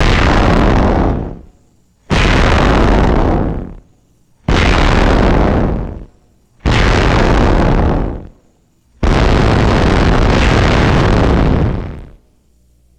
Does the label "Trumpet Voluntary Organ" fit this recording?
No